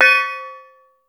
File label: finger cymbal, Drum Machines, Yamaha Motif ES-Series